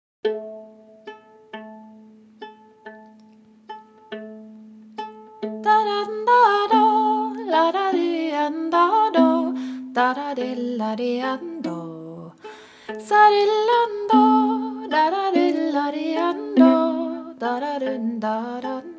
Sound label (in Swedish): Vals; Emma Lotta; e Jons Persson